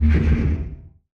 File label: distance shield hit, weapons; Stellaris